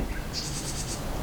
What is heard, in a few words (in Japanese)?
シジュウカラ, 【危険注意】; Parus minor